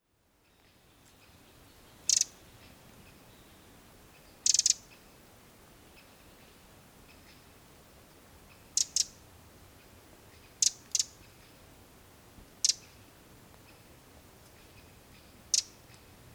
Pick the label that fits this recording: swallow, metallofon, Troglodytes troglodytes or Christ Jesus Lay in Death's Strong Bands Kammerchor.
Troglodytes troglodytes